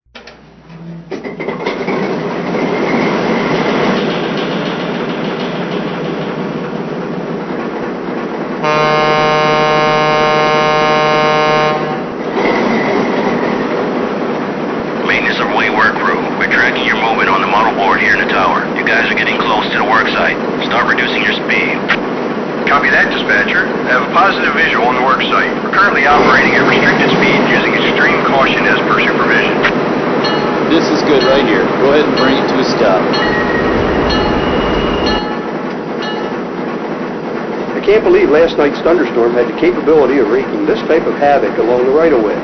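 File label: Alco RS-3, locomotive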